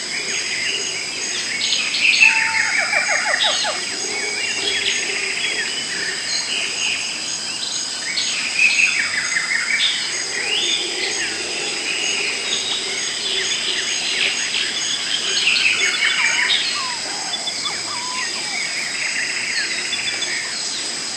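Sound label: jungle ambience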